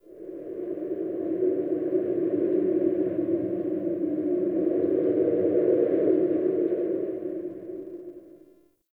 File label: Wind Howl; Ambience; Dungeons; Under City